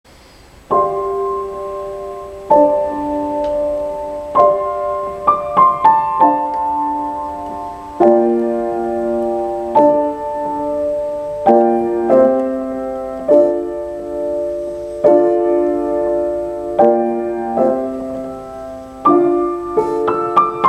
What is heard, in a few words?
Wind Chimes for Solo Piano